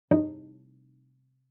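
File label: Cello pizz fortissimo sul G Eb4; MIS Pitches - 2014; Strings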